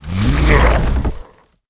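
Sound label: Legendary Tree Attack; Seer; GamigoNA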